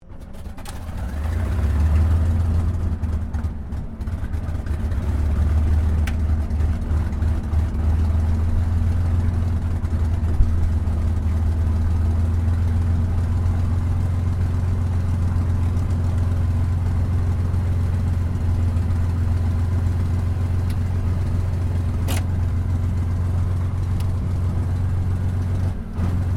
AIRCRAFT CAC CA-18 MUSTANG MK23 STARTUP IDLE INTERIOR; CA-18 /P-51 Mustang; Airplanes (Sound Effects:Vehicles)